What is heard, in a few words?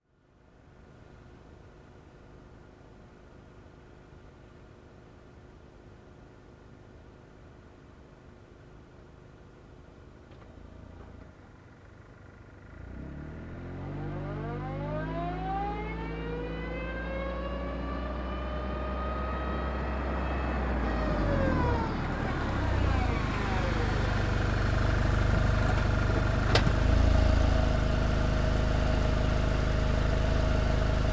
Car reversing and stopping nearby